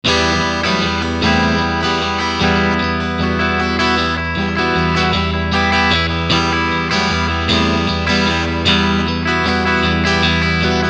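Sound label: Clean Strumming Middle Bridge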